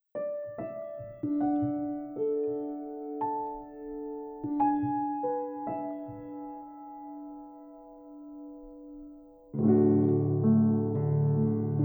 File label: white baby grand, impro